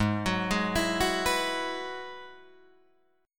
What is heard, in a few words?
G sharp Minor 7th Sharp 5th G sharp down Guitar Drop D